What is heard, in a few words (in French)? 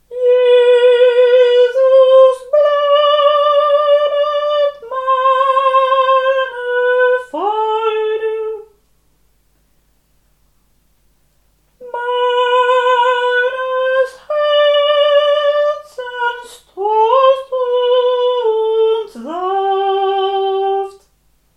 soprani; jesus bleibet meine freude